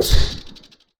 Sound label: spore explosion